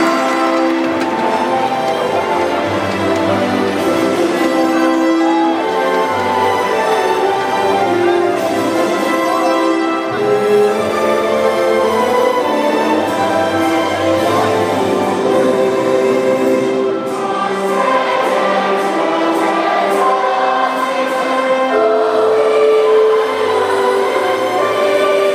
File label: australian national anthem socceroos